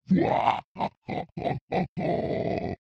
怪物 笑い声

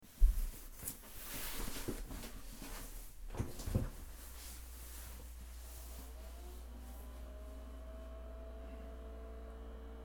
脱ぐ、座る、おしっこ音、履く、流す, 吐息あり, トイレ

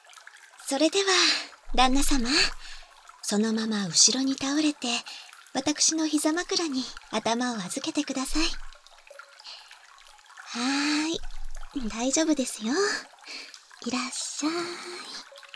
頭→シャンプー、頭マッサージ（バイノーラルマイク収録 効果音あり小さめ）

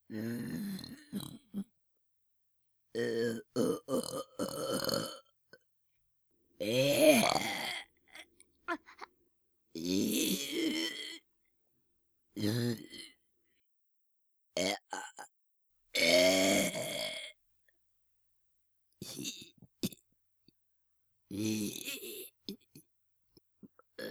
ゲップNG集

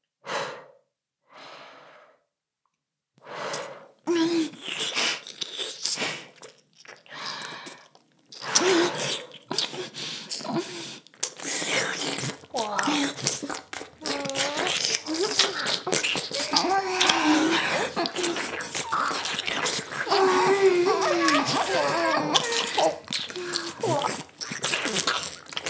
耳舐め＋フェラ, ASMR; 淫妖精の森

